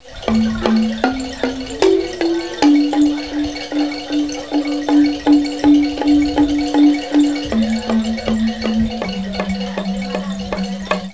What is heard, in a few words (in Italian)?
suonerie ambiente